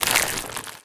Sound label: foley, zombie revived; Half Life Alyx